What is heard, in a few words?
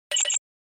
Accent